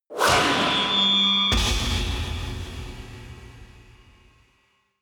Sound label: whoosh scifi heavy hit